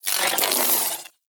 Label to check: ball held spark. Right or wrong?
Right